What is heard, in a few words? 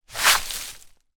foley foot kick dried leaves on small wooden bridge forest